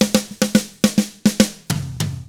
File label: pop rock drums; 105POP ROCK